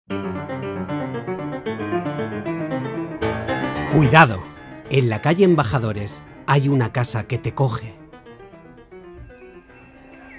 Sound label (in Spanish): Suspense; La Casa Encendia; Taller De Radio Experimental